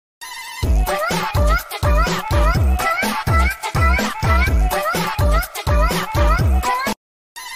uwah uwah uwah

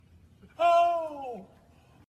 old man oow

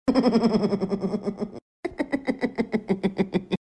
hamster hmhmh

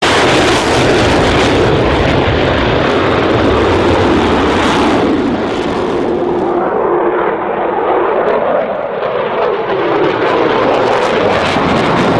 f 22 raptor

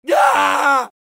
aaaaaaa; tarkov

